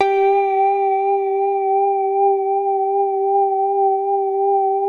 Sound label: SOFT G4; FINE ART 3